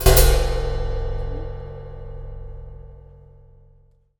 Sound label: Cymbal; Percussion Samples